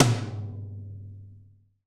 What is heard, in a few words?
TOM, Real-Kit; 90 s Sample CDs; Sound & Vision - Gigapack I CD 1 (Roland)